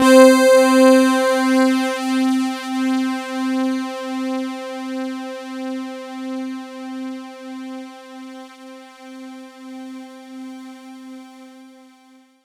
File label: C4, Synth Line; 90 s Sample CDs; NiceBeats; SynthLine VOL-31